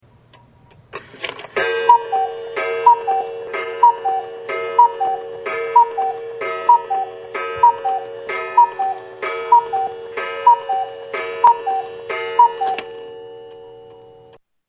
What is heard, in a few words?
Cuckoo ticking and 12 chimes